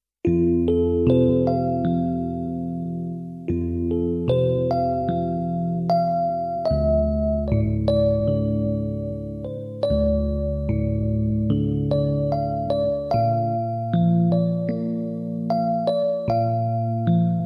432 Hz

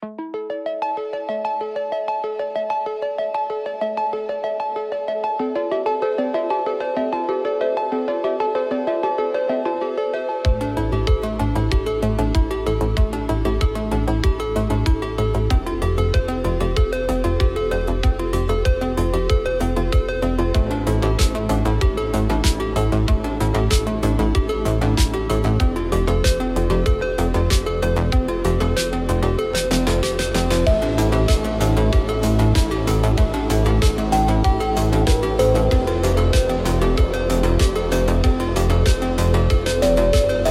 Electronic Impulse

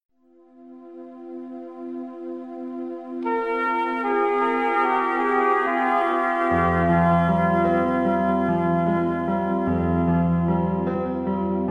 432 Hz

